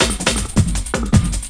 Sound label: LOOP SD, Drum & Bass, 160 BPM